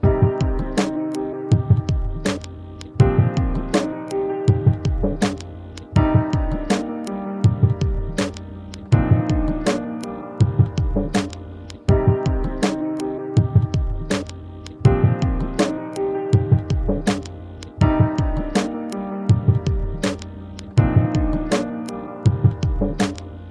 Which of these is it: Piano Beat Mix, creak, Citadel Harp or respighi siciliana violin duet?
Piano Beat Mix